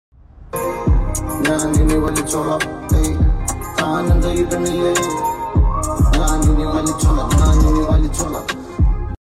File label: singing song; sreenath bhasis instagram